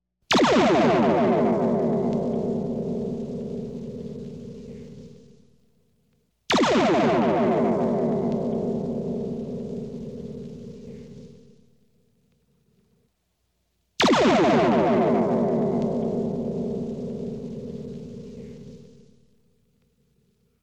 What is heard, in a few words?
Photon Torpedo; Torpille à photon-TOS